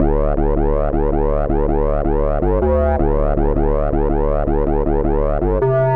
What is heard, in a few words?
synth, Hardcore Breakbeat 161 Bmin; Big Fish Audio; Hardcore Breakbeat Action